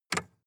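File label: sports car interior lightswitch double short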